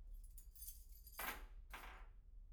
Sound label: keys; IEEE AASP